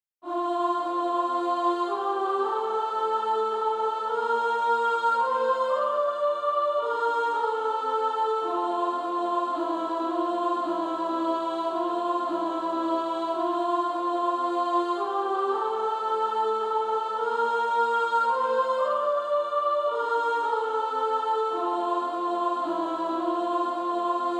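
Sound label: Female Choir, soprano 1; Sweet Hour of Prayer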